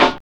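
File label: SN, snare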